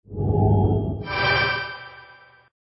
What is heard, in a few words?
box exchange